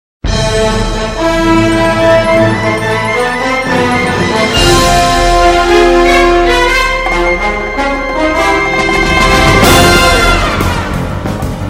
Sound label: music brawl overtime 95bpm; Incredible overtime